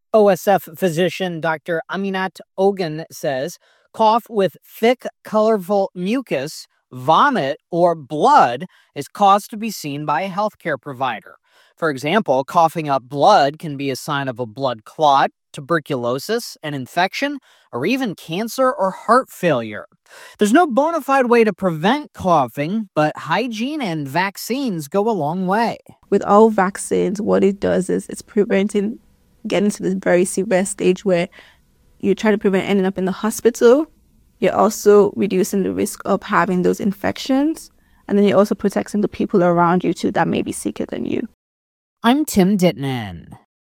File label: coughing